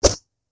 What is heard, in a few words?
Swoosh Sound